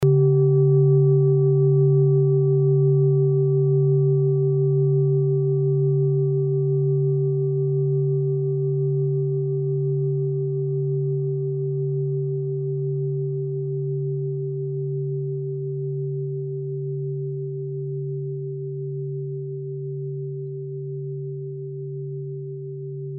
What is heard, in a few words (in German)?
klangschale orissa